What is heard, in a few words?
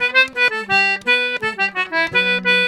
C3 L, Celtic Flavours; USB Soundscan vol.42; AKAI